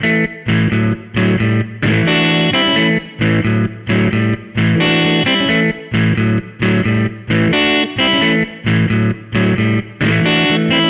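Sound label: Guitar Blues A major 88bpm; Guitar and Bass; Ableton; Factory Packs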